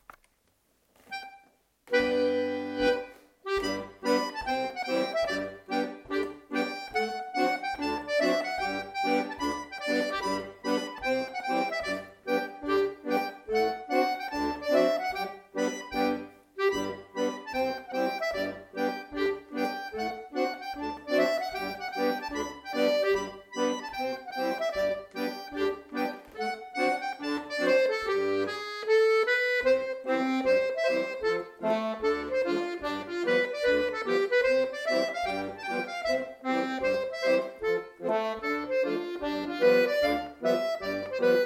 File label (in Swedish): schottis